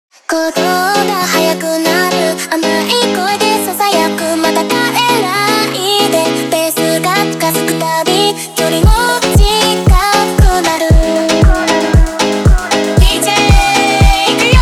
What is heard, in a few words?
Montagem slowed